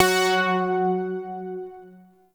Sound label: Shawzin Oct Two F Sharp; Music; Warframe; Zariman